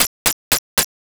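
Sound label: footsteps wet road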